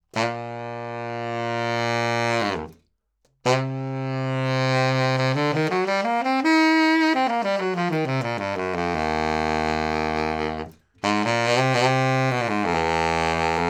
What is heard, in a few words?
Sax Baritone 20cm High Mid